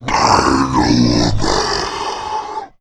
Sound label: alert; agrunt